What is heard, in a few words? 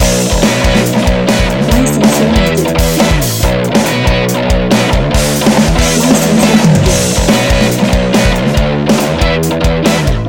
Rough Bass Loop